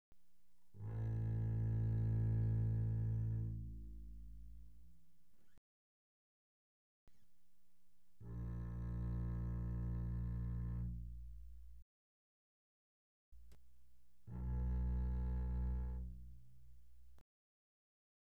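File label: Bass arco pianissimo sul A A1 B1, doublebass; Strings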